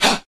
ha

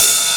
Hats Electro Open

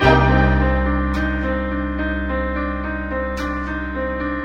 piano, Sad

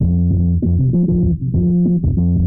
bass, Loops, Phonky

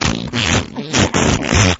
fart medium

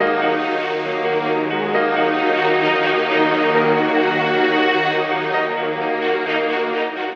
piano and strings, Sad Loops

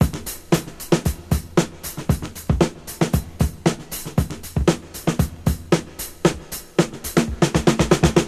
chinese chicken, Drum loops Boombap

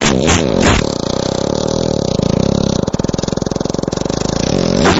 fart long

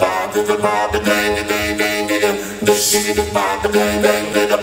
voice bom dida bom di dang dang robot looped; Loops; Phonky

